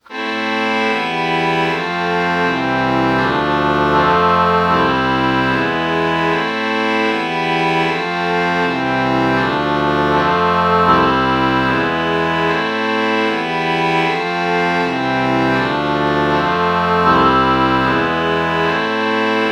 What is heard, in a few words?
oboe violin chord morph